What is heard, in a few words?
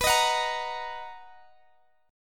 B Major 7th B down Guitar Open G